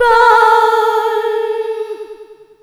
POHOOOOOO, ADLIB WOM, Funky Vocals; USB Soundscan vol.18

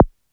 bassdrums, techno; Techno ID; single-drums

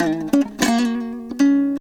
HALAM MELODY; Best Service; World Colours

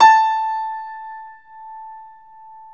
PNO Grand Pno; Club-50 - Foundations Roland

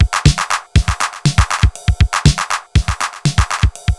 TR 505 (120BPM), beats; musicradar; cyborg beats

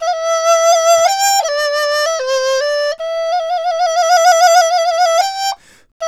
DIZU, 90; INIS - Opium

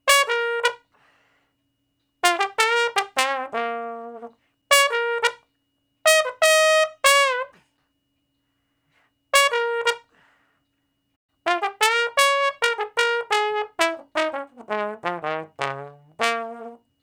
Trombone Shuffle (Bb); Crimson Blue and Fabulous Horncraft 4 RnB; Horncraft for R&B; Solos

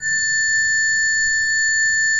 SWL A5, Cathedral Organ, SCHWELLWERK; Propeller Island

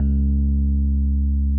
RHDSM2 C#1, Rhodes EP; Sonic Reality; Vintage Timetraveler; RHODES EP2

